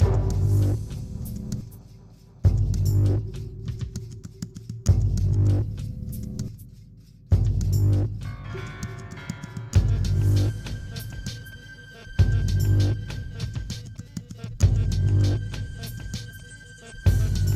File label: Low Health; Spider-Man 2 The Game